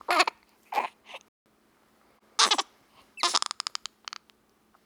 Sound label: Two week old Baby; Robert Cordova